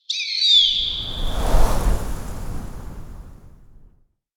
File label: Hawk and eagle scream soaring sky; bird